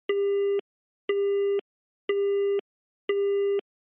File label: Telephone Signal Tone Busy